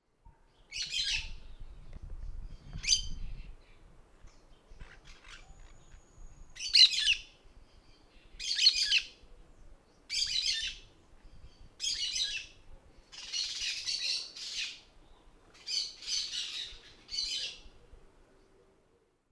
Periquito de encontro amarelo Brotogeris chiriri; Jacauna

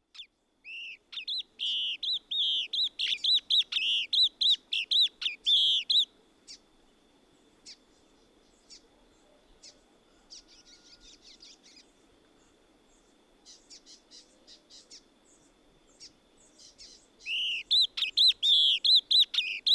Guaracava de barriga amarela Elaenia flavogaster; Jacauna

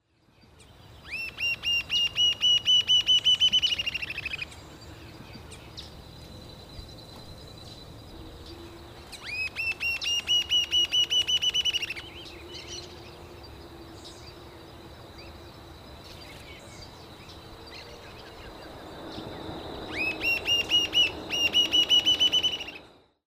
Ariramba de cauda ruiva Galbula ruficauda; Jacauna

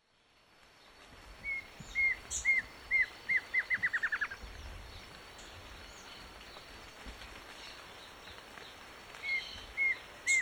Choquinha-lisa Dysithamnus mentalis; Jacauna

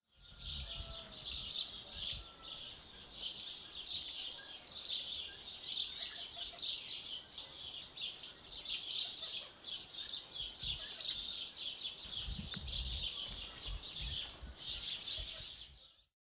Suiriri Tyrannus melancholicus; Jacauna

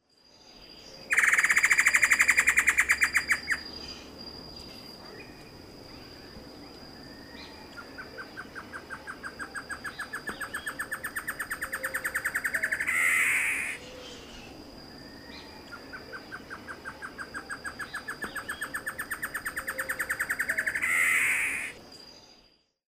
Choró-boi Taraba major; Jacauna

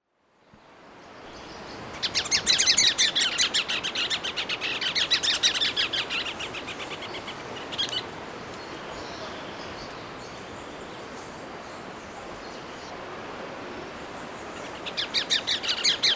Arredio do rio Cranioleuca vulpina; Jacauna

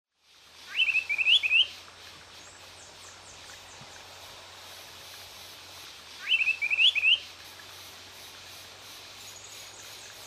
Soldadinho Antilophia galeata; Jacauna

